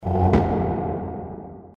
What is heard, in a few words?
ship sink; kbattleship; kde